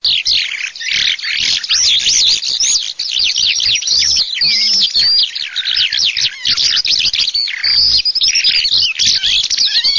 ALLODOLA

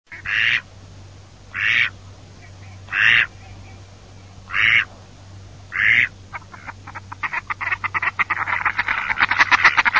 GERMANO

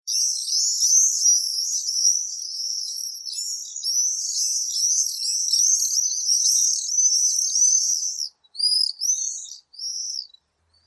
Rondone comune

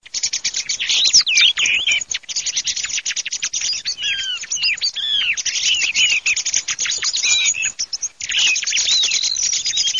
TORDO

